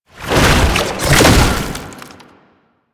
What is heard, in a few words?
Run Stop, Foley; Enemies; Warframe; Proto Infested; Babau